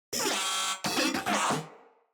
Moa Vocal Enemy Spotted

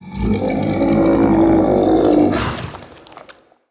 Camel Idle Vocal; Zenith Camel Vendor; Vocalizations

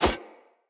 Split Sword Whoosh Fast; Weapons; Player; Swords; Starter

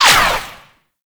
Ranged Weapon Whizzby; Warframe; Necramech; Weapons

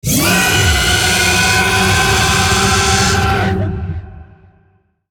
Vocal Attack; Dialog; Warframe; Zariman; Void Angel

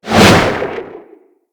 Melee Slam Generic Whoosh; Weapons

